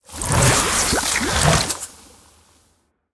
Toxfin Underlay Attack

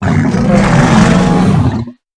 Clefthoof Play

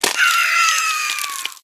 Swamp Dragon Egg Death

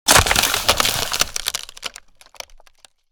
Treant Medium Death Underlay

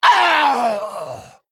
Female Worgen Death

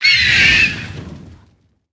Fireplume Phoenix Death

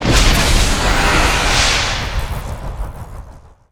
Whirlwind Tempest, Play

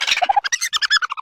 Wolpertinger Play

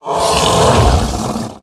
Attack Sound

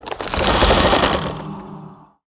Ancient Totem Attack

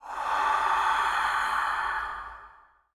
Ghost Underlay Attack

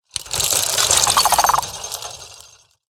Prosthetic Arm Play

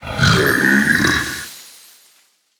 Mana Treant Attack

